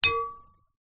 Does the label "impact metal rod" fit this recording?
Yes